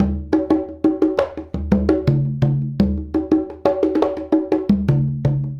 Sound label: Congas; Essential Percussion